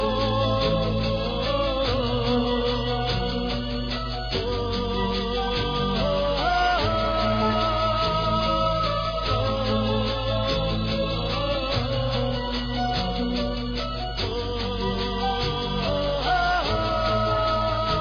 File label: Oohh Hoo